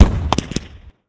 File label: Fireworks Explode